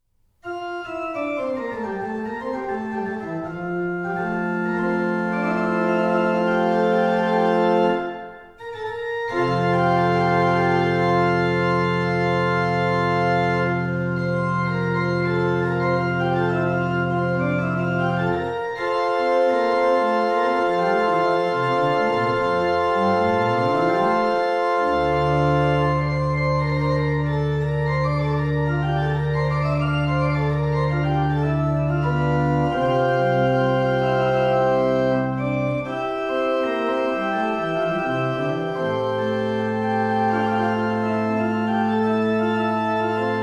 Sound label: Toccata in F Major; Froberger Toccata 7